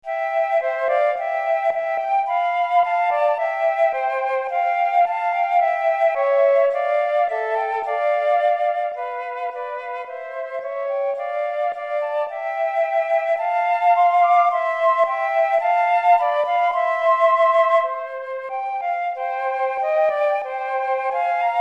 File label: Duo Flute Duet